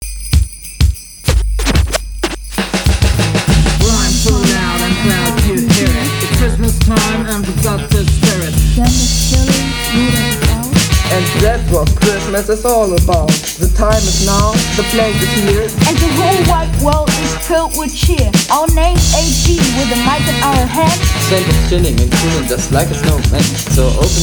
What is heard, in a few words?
christmas rap